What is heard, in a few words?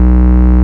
Root B, Ultimate Bass; Vengeance Samples